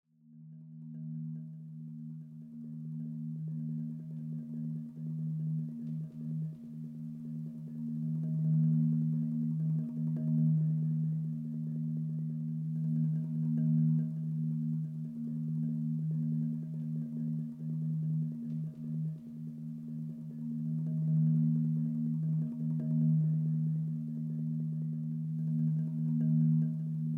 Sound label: Drum and Harp; Mood 5